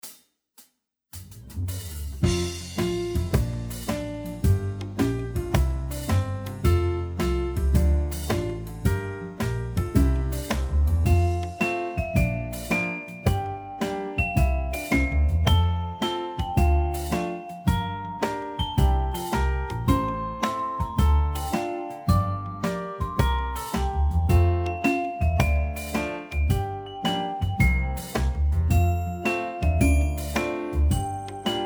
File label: I love the flowers backing track slower version; Singing Assembly